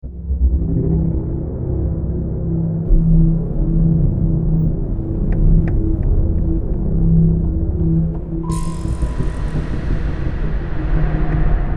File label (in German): Klopf Klopf Musik